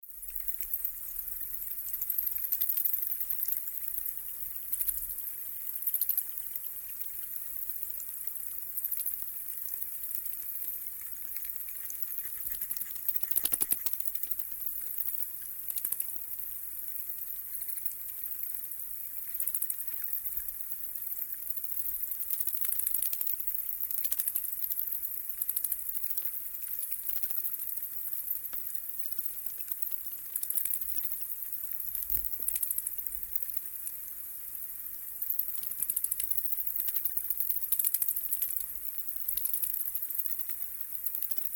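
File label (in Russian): голоса летучих мышат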